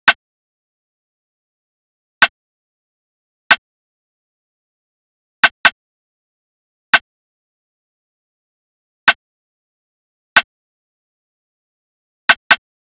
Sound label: Drum Loop 140 BPM Snare, Drill; Loop Stems; Brute